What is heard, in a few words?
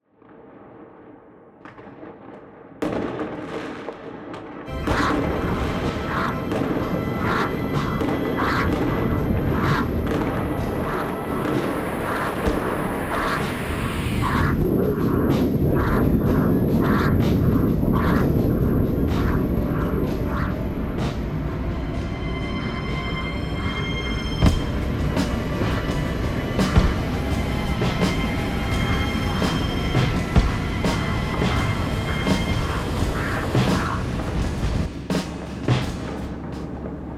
atmo